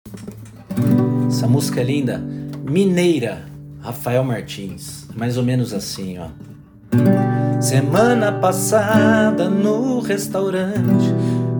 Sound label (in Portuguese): mineira voz e violao